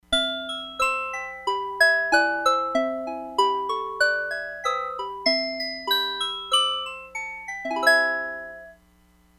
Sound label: SYNTH BELLS